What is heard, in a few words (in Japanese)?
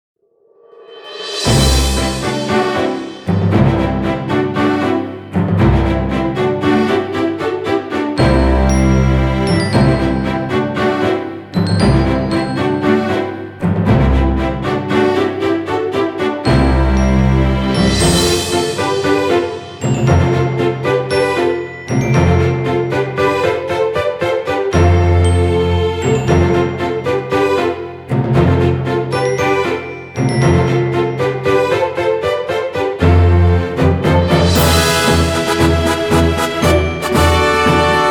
ファンファーレ; D-Frag!; D-FRAGMENTS Game Creation Club CD ~ Soundtrack 2